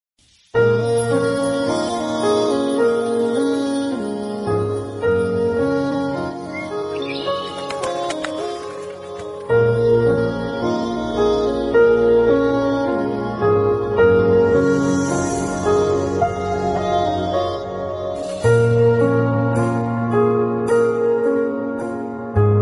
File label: attitude bgm ringtone telugu; arya; alluarjun